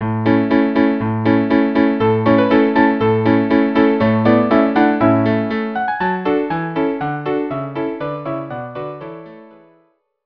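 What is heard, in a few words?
tambourin string quartet; gossec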